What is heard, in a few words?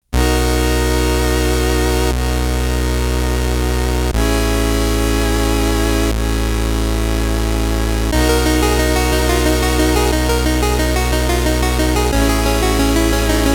Chiptune version; The Dark Spire